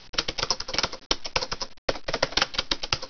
typing medium

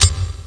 m4a1 clip

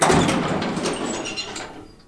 slide open

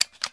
mag out wet, weapons, MP5

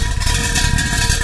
move, Metal; fisica

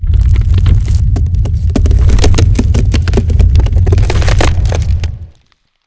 stomp wave; garg

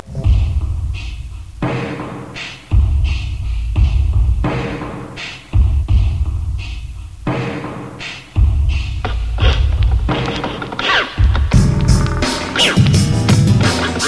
Dead Sector, ambience

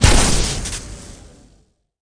gauss, weapons

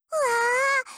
start; m4mod

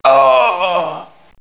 die; payned

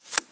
card insert

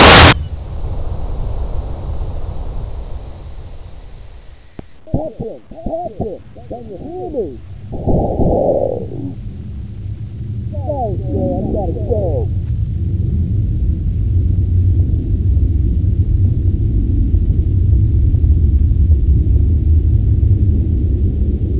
ears ringing

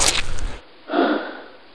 syringe use

